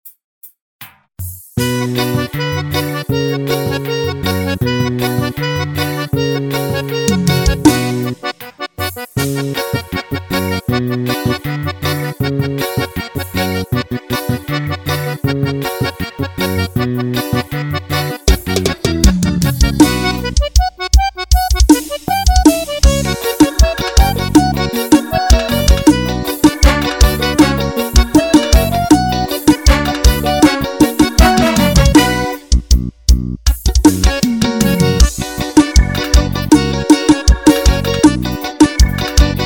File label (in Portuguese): forro